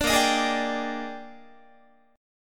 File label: Bb 13th Bb Guitar, chord; strummed; 17,18,18,18,18,18; 4A,4E,5A,5D,5G#,6C#